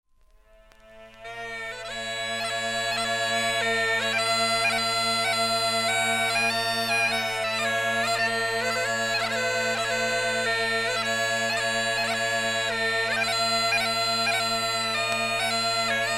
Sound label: harmonica